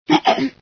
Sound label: die, female